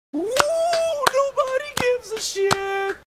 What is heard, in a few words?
oooooh